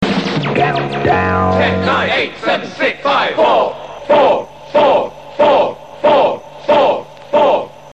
Countdown Jingles

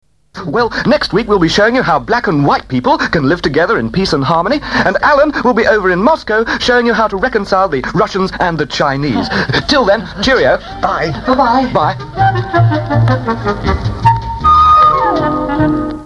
Jingles

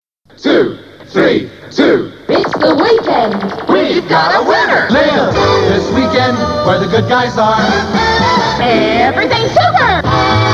Jingles

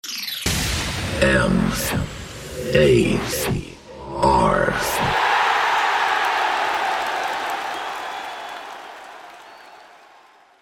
M A R CHEER, Jingles

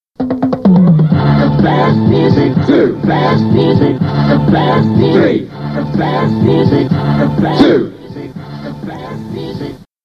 Jingles

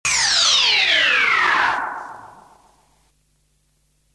Stinger

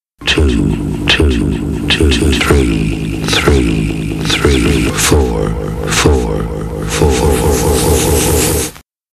Jingles

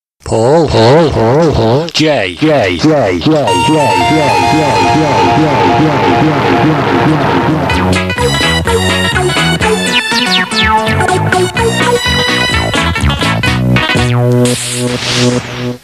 jingles

